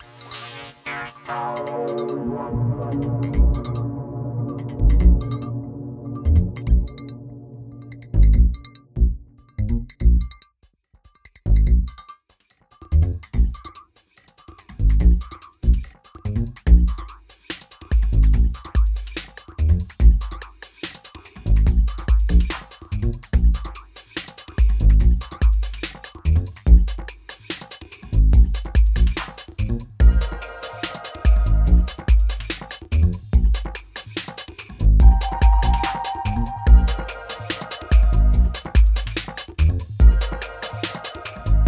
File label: Solo 144BPM